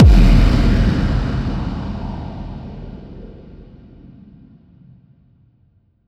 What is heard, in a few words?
Impact Quake; Riddim; One-Shots; SFX; Ghosthack; Riddim Essentials Volume 2